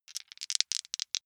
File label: big two rattle in hand short